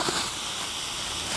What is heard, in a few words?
fuse; CrossCode; battle; vulturbine